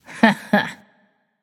"heh heh"; smite; voicepack; nike

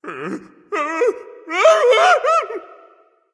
fear, voice; Smite; ymir skin12

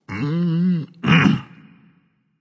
content, voice; Smite; ymir skin14

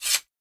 recall sheathe sword; Smite; Susano

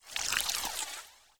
arachne spider egg idle; smite

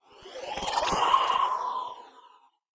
wraith; smite; ahpuch skin10

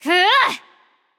grunt attack; charybdis

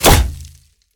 bow hit; smite; god artemis

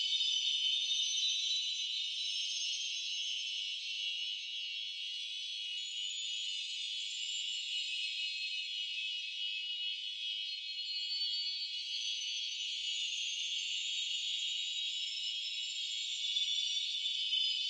Passive Heal; smite; horus

